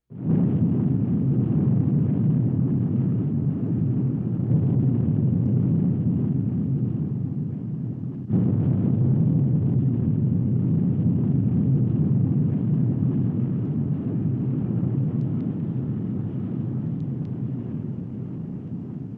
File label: Series of Explosions